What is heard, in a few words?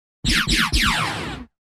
Synth Energy Zaps Triple Burst Higher Pitched, Electronic Sci-fi